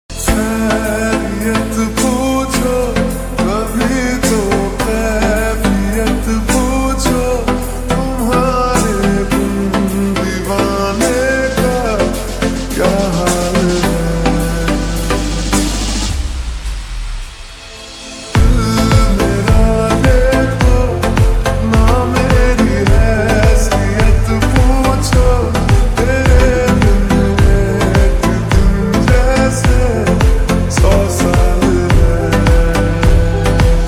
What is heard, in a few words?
Ringtone Slowed Reverb